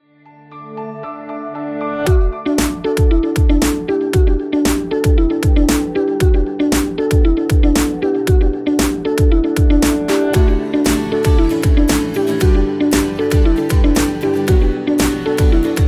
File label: Business Background; corporate music vol 6